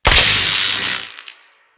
Beam Hit Effect; Enemies; Key; Captain Vor

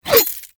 Pistol Projectile Pass By; Weapons; Warframe; Tenno; Temple Signature

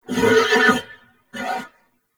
Horse Vocal Mount Neigh; Vehicles; Vocals; Warframe; Duviri

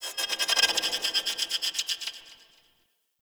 Corpus Ship Data Rand, Ambience; Warframe; Corpus Ship Remaster

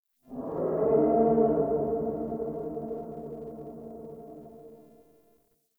Exterior Distant Calls Scared; Ambience; Duviri

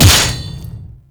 Hammer Glass Impact

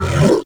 Flying Mouth Attack Fire Empty; Enemies; Warframe; ManInTheWall

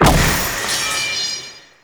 Grineer Royal Guard Sceptre Drop; Foley

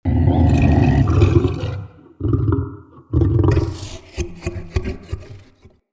Behind Wall Attack Growl Warning; Vocals; Bromius; Animals

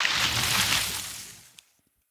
Rage Vines; Fey; Champion

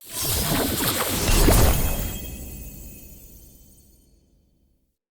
UI Brewing Claim

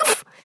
Pain, Adult Operator; New War

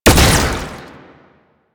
Proto Infested Projectile Fire; Warframe; Brachiolyst

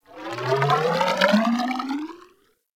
Ocean Cloning Machine Gurgle; Ambience; Props; Warframe; Grineer Ocean

